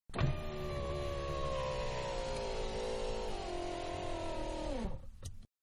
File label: Mid Size Car Auto Window Closing, Interior; Cars (Sound Effects:Vehicles); Manhattan Production Music